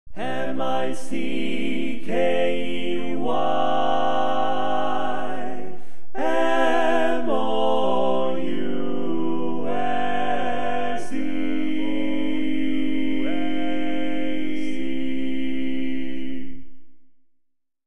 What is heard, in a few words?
Bass, barbershop